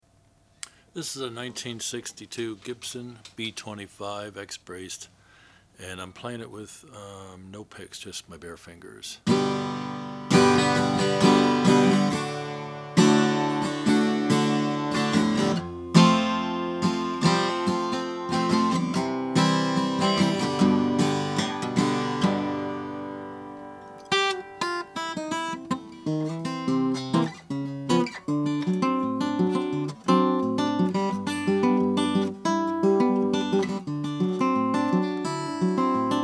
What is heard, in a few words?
gibson b25n cherry burst